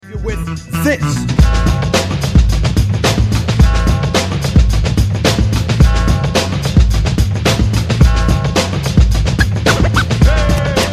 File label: Beat